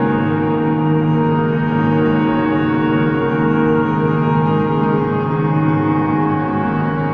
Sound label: Piano Blur